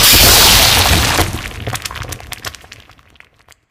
object dissolve in goo; Source Engine; Portal 2

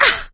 hurt minor, survivor, voice; source engine; left 4 dead 2; producer

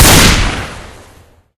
shotgun fire incendiary; weapons; shotgun chrome; source engine; left4dead2

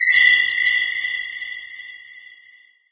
amb rand abstract hi; video games; ambient; random abstract; source engine; portal2

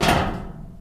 metal grate, player footsteps survivor walk

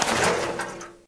draw gas can; tf

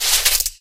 cloth foley

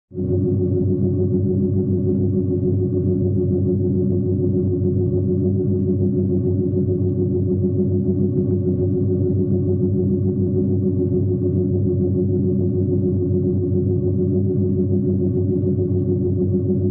sci fi headquarters hum; cartoon; deception

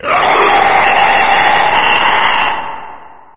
stalker scream; npc; source engine; episodic

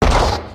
giant demoman step; source engine; tf; mvm; video games

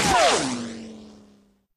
scout jump; video games; source engine; tf

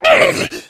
pain; Left 4 Dead 2; Source Engine; video games; jockey

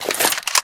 smg deploy; Left 4 Dead; Source Engine

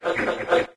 beep, windows sound schemes; the golden era; windows 9x

